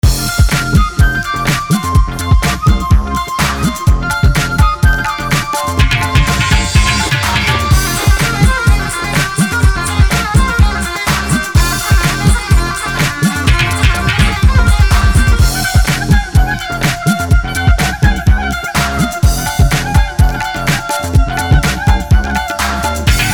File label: Indian Rock